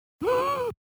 gasp; Dizzy Bee

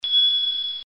ding; English Prep Package; Rex Does Tricks

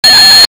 sparkle; English Prep Package; RexDoesTricks

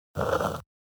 slurp; Dizzy Bee

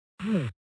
grunt; Dizzy Bee

